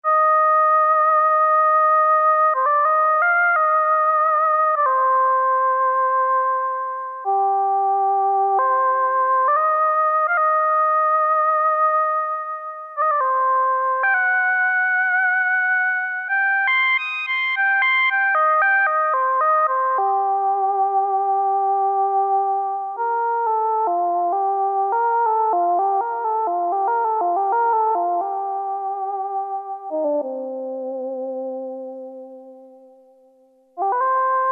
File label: SOLO SOPRANO; Akai SG01v